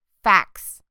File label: fax